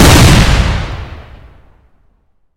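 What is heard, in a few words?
sharp distant shell launch with explosion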